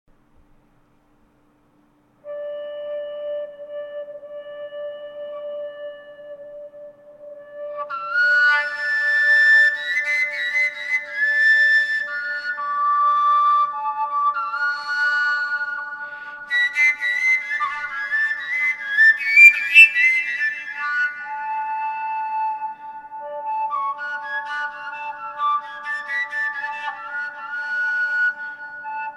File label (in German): Obertonflöte